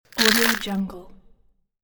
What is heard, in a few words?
crunch